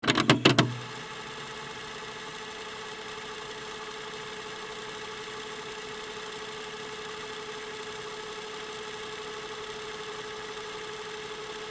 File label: Cassette tape player rewind and stop sequence